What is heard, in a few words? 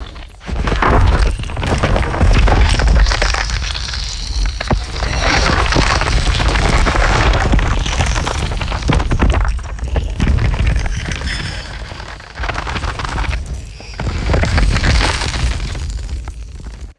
heavy rocks scraping and grinding; natural-disaster